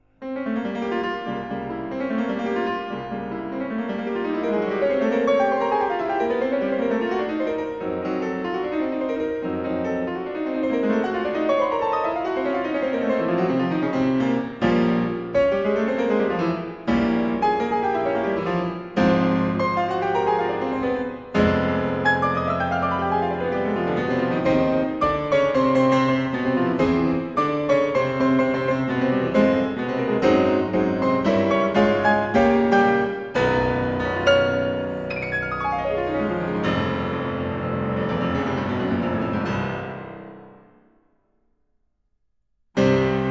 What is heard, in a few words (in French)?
En Fa Mineur Allegro Molto, Piano Solo; Chopin